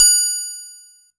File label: Korg N1R Metronome Bell